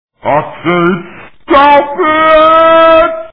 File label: train